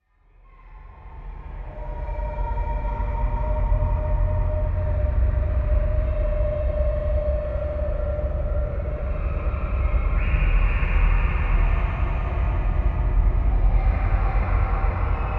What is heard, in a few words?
Children Talking And Screams Eerie Underworld Screams; Hollywood Edge; sound designer's toolkit v2